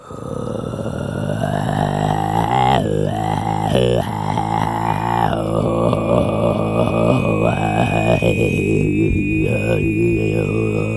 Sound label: MONG SING, MONGOL VA; USB Soundscan vol.60; Asian Traditions; AKAI